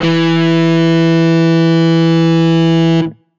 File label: guitar electronic, distortion guitar